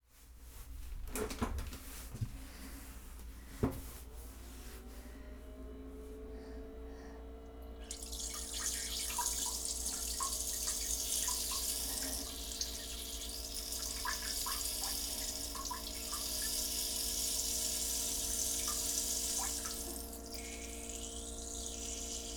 脱ぐ、座る、おしっこ音、吐息, 少女, トイレ

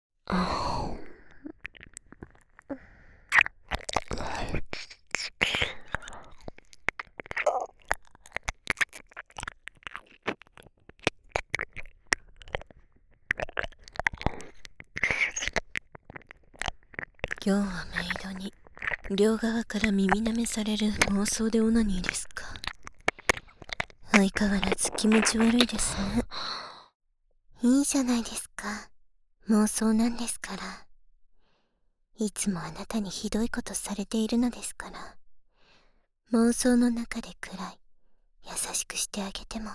メイドの両側耳舐め; 妄想オナニー

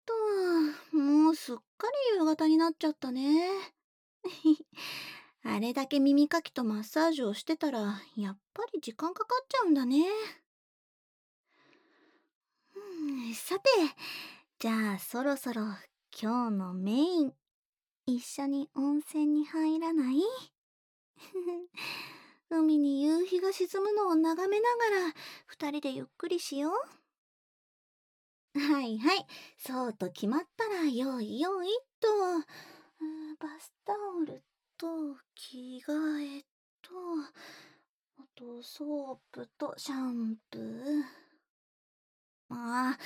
くちゅ音無し, 耳舐め＆手コキ; 弱いところ、気持ちいいところ……全部シコシコしてあげるね♪; SE差分あり

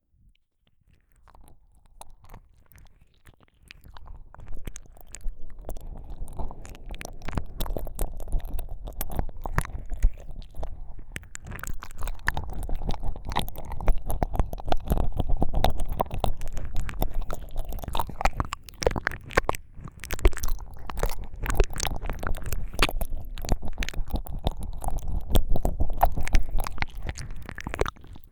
いろんな両耳舐め, ASMR

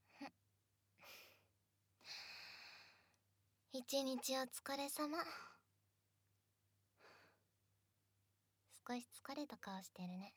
マッサージ, ASMR; 後日談2

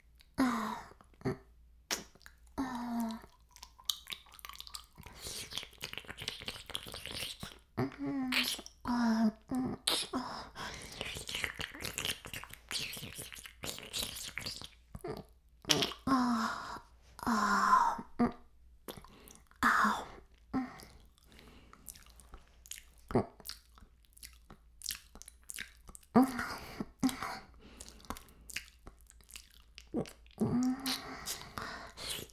耳舐め音声, ASMR; 白くて黒い魔性円環

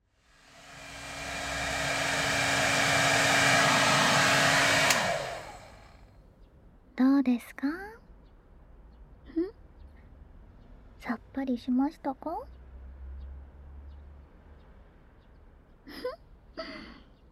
耳かき＆マッサージタイム

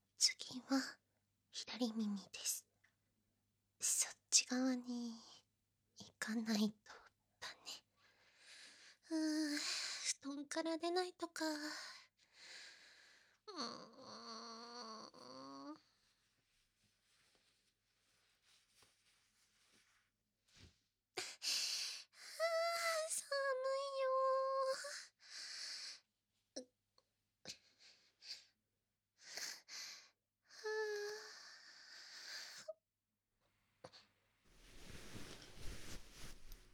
耳かきする?（左耳）, ASMR

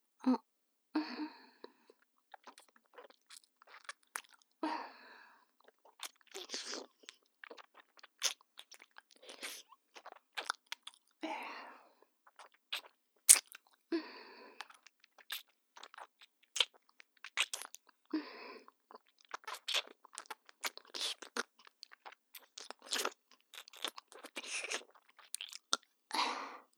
フェラ音

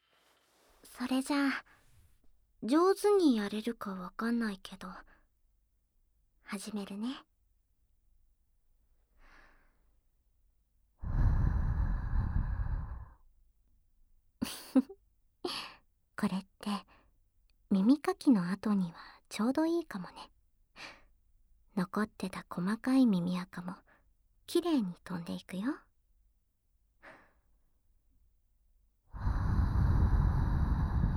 耳フー 耳舐め, ASMR; 耳にイタズラしちゃうんだからね。

